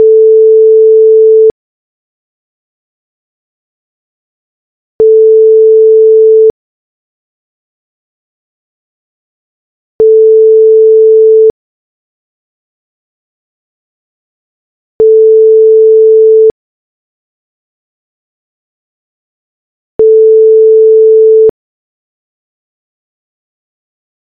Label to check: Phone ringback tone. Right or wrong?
Right